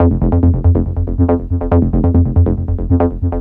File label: NRG Bass, Trance